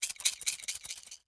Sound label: Toothpicks Container Shaking, Household